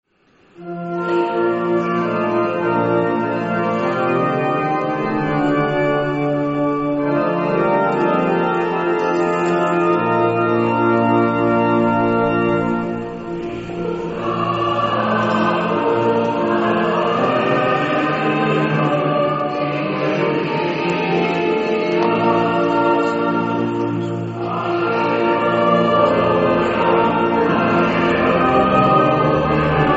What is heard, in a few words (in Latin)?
cantus